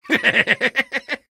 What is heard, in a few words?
laugh happy; vo; soldier